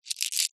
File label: foley stones gems movement in hand